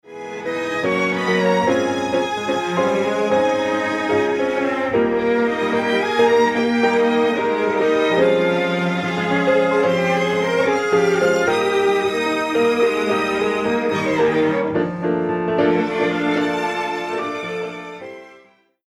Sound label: Strings Dual Sample Piano On